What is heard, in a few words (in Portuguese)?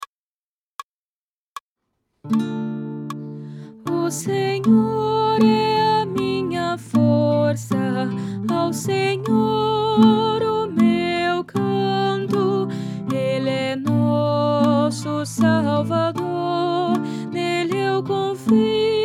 O Senhor Soprano